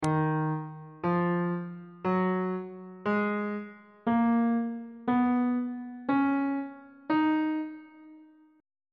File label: d sharp aeolian mode note positions